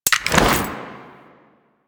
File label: Invisibility Throw; Warframe; Quests; The New War; Drifter; Abilities